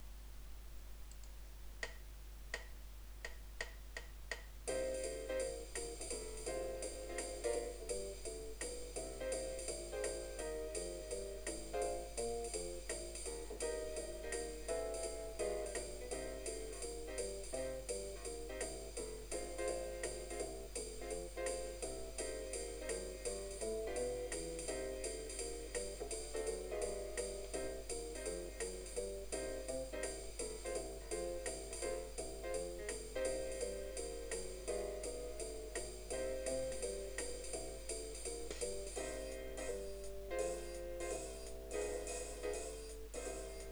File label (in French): accompagnement seul